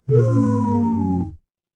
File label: feedback fail